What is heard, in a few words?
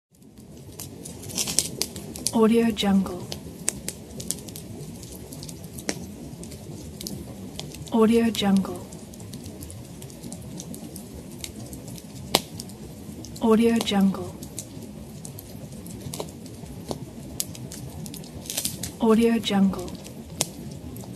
campfire